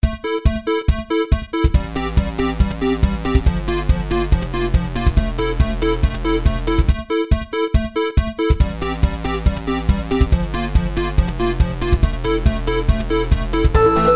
tension and release, chiptunes

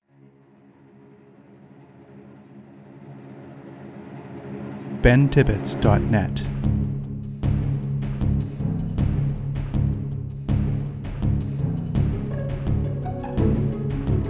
Building Excitement Ident

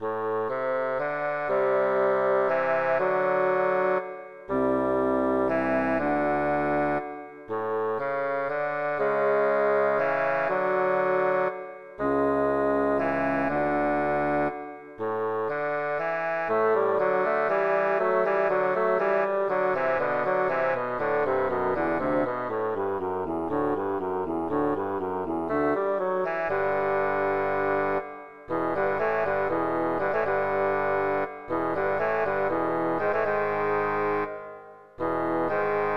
bassoon temple, chiptunes

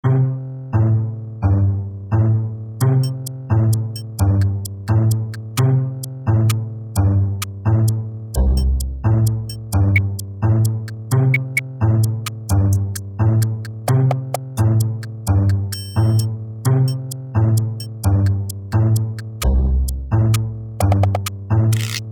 lily pads; juvenilia

